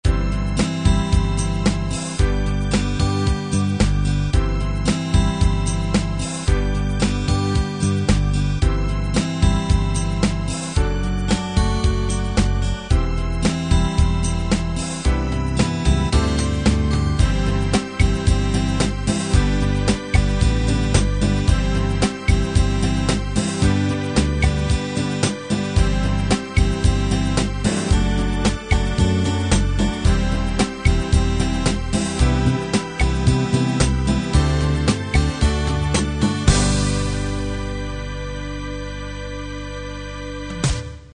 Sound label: unplugged 8bt